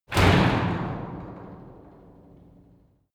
garage door close short hard

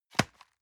envelope drop multiple hard

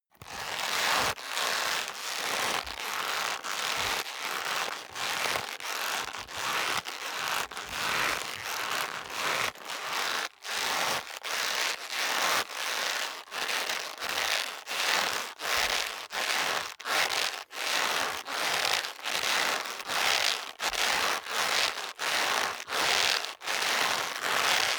leather creak sequence medium speed

